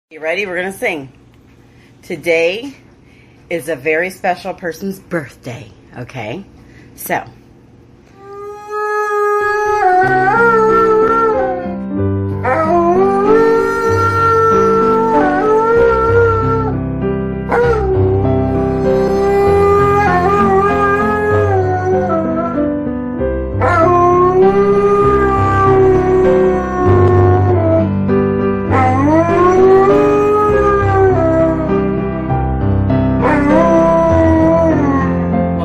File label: Malamute Sings Happy Birthday Song for dog